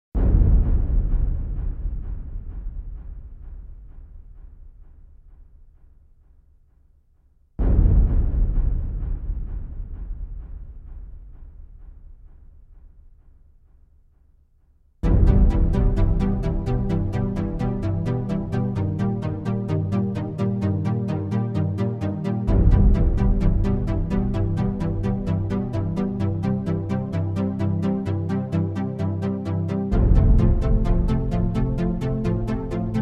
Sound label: synth and drums